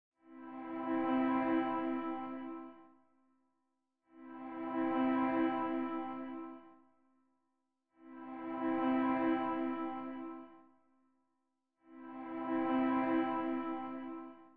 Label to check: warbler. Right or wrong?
Wrong